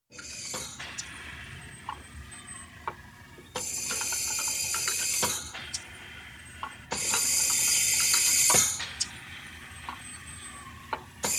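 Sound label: artificial respiration apparatus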